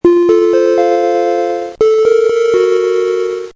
chimes of death

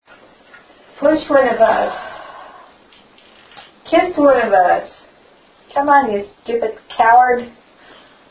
The Dance Studio evp sigh help

